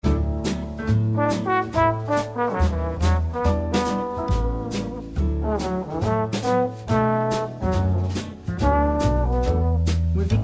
old time blues

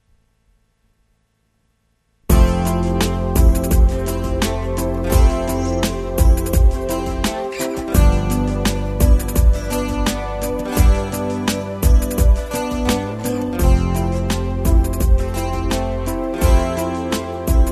halling bells

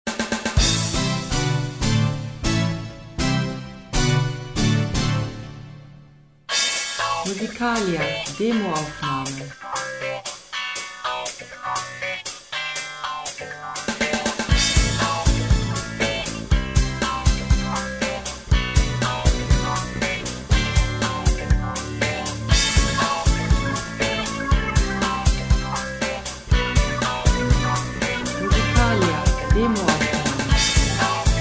funky jingle

